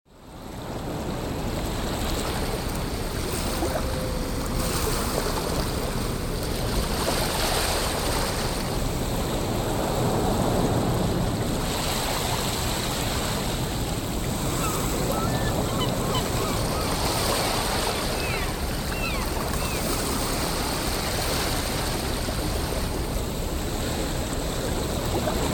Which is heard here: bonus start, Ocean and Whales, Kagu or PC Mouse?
Ocean and Whales